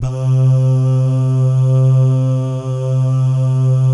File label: BAH GUY, Vocal Planet Jazz & FX; ILIO; BAHS GUYS